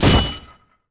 sword

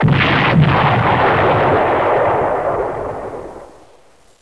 explosion; PC Gamer IT CD 35

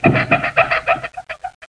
Wobble; The Pea; SoftKey

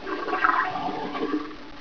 WATERIN

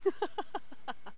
dryads; War3demo

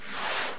rocket fire; Native Assault 1.2

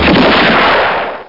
pistol; Total Chaos Nukem 3d Pack

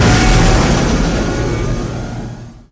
warp enter; Earth and Beyond; EA Games

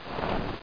DIESLIDE; BOXCARS

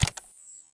ROTARY, Gadgets; XCOM

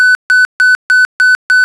Autopilot; Virtual Wings

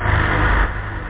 TECHNO; da capo vol1; instruments; stabs

